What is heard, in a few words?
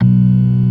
ORG. B1; B3 FULL